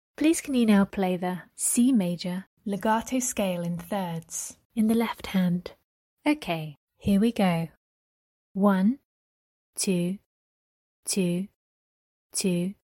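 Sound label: C major legato scale in 3rds left hand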